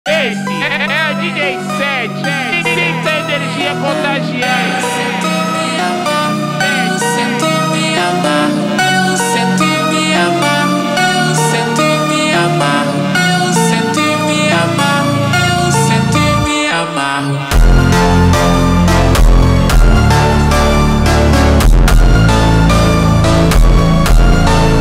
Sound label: funk super slowed